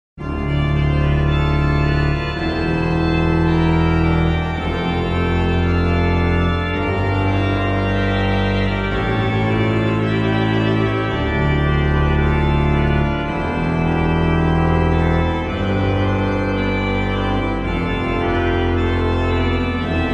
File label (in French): Orgue Solis ortus cardine (en basse); Souvigny; Organ Music; Couperin Louis